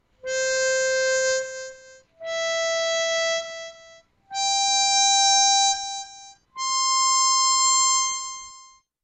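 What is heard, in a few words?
Blow notes, harmonica; Breathing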